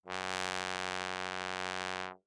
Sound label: Bass Trombone ff Gb2; MIS Pitches - 2014; Brass